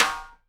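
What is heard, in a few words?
SIDE STICK, Live Snares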